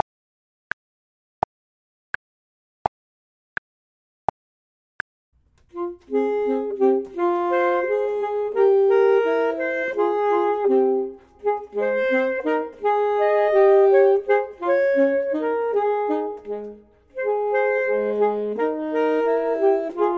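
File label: both, Saxophone and Clarinet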